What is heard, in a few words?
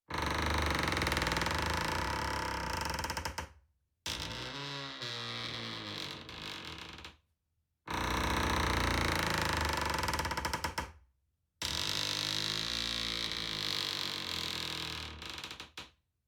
wood creak on old ship series